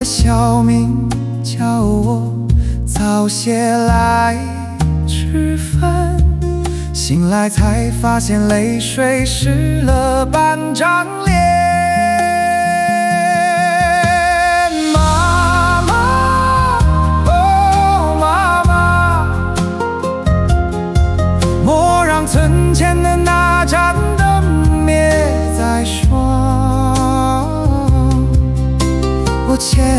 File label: hook mixed